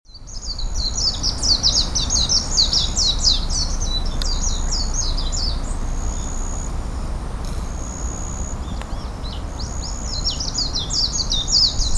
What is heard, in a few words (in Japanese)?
meziro call